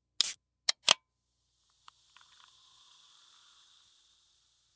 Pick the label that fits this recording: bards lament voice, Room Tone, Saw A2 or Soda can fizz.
Soda can fizz